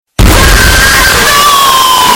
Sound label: bassboosted